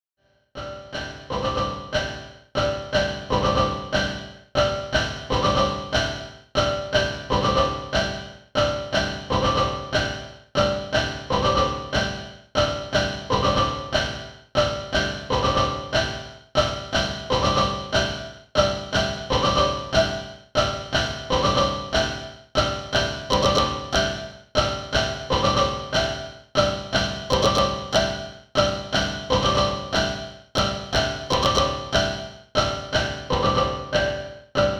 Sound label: Rossum Electro Music Morpheus Vocal Cube